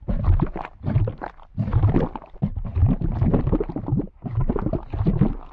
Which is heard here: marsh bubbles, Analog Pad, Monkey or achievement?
marsh bubbles